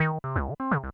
fastsong

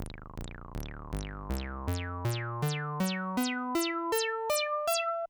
arp

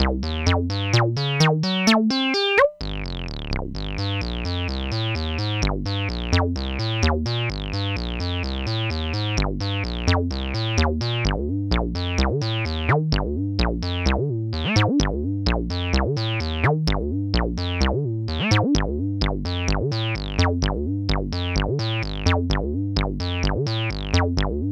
sqr

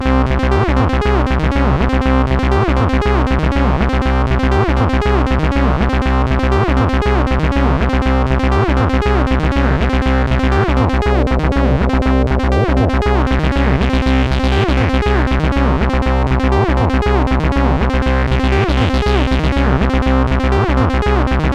mbsid psytrance dry

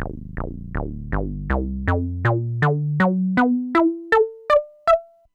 arp

